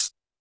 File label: Roland TD-20 Percussion